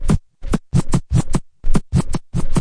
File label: turntable scratch; Scratching and Turntablism; DRUM LOOPS and BREAKS